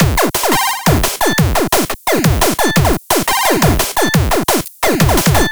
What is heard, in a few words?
chip DnB; flstudio